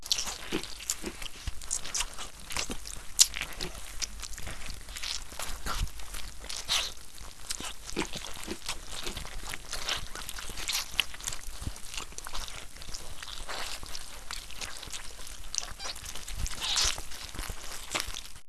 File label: zombie meal; easyrpg; WitchsHeart